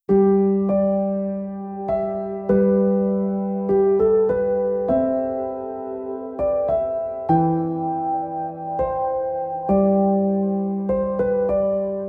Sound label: Gevoel, PIANO